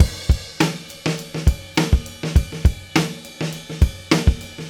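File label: 102 bpm, beat loops; Zero-G; Monster Beats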